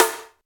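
noisy snare single hit A key, snare tuned to the A note; snare-drum-samples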